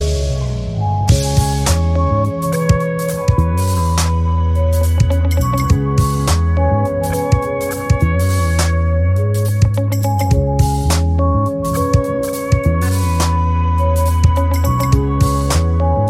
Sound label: tone ringtone, samsung